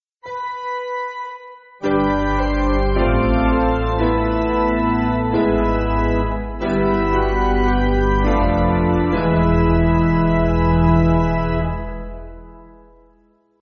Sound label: Twofold Amen, Piano; Persichetti; Basic Piano & Organ (CM)